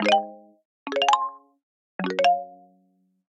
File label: Wooden Xylophone Strum F# Lydian 110 bpm; MIDI Clips; Tonal; Ableton; Factory Packs; Lost and Found